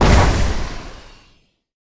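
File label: Pillar purple explosion, monster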